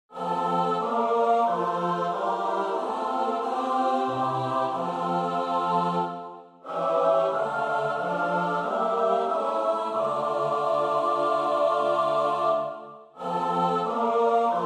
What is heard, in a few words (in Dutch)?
Choral S-A-T-B, koorpartij; Bach (Johann Sebastiaan); BWV 246 Lucas Passion